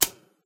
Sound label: Switch Off; hello neighbor pre-alpha alpha 1